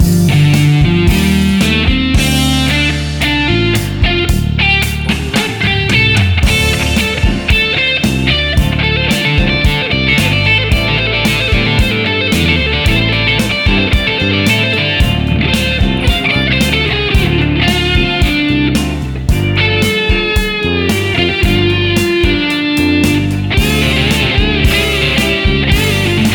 Black Magic Blues

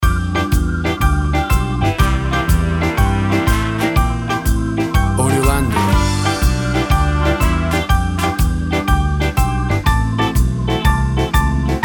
Train Ride into Bluestown

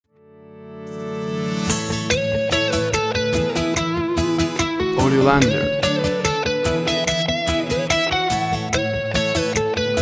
I LOVE YOU WITHOUT VOCALS

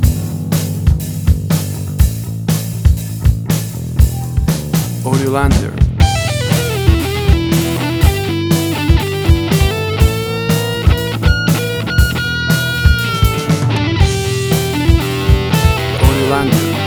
80s Street Rock

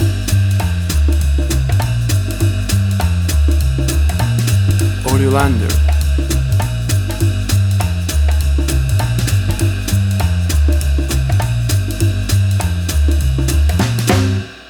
60s Acid Jazz Music

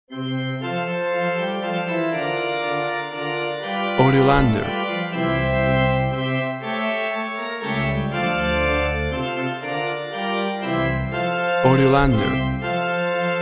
We Wish You A Merry Christmas Church Organ

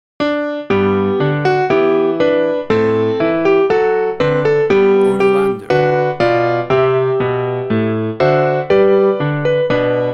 Classical Piano; A traditional version of this classic h; AULD LANG SYNE; AUDIOLANDER

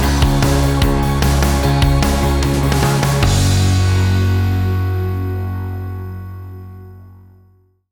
Stinger

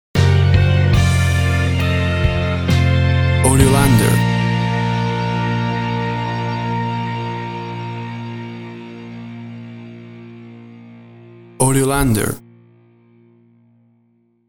USA National Anthem Stinger

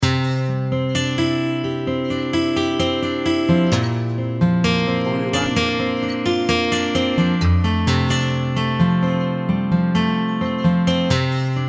Acoustic Guitar Theme

